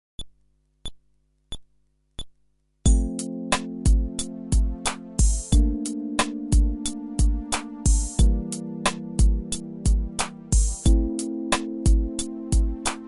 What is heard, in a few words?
Groove ベース無し